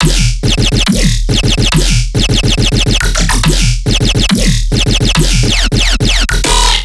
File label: Riddim Bass Loop 140 E Jagged; Dubstep; Riddim Basslines; Ghosthack; Advent Calendar 2019 Day 17; Riddim Bass Loops